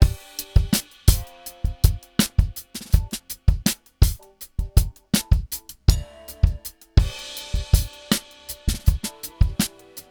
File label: Chandler Zener Limiter TG12413 DRUMS Comp1 THD